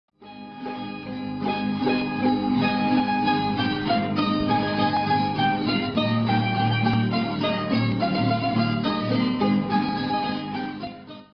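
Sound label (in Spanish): bandurria; tradiberia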